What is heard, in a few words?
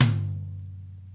real life TOM, Real Life Kit; REAL LIVE KITS; Another Real Life Kit